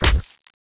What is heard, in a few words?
body; Thief